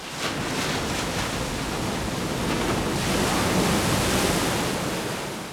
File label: ocean; Ambient; MX vs ATV Unleashed; XBOX